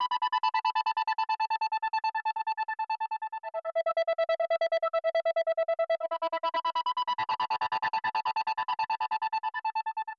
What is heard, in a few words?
Whistle Synth DRY 140BPM, Eminor; Melody & Synth; Vengeance Samples